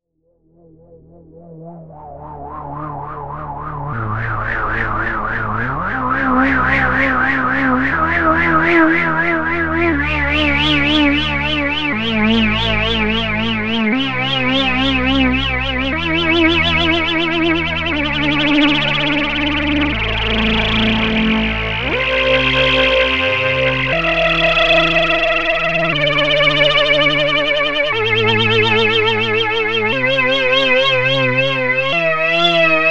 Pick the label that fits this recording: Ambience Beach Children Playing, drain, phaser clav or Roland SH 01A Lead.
Roland SH 01A Lead